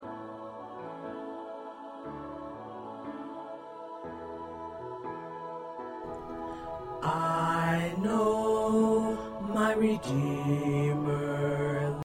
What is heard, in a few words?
Bass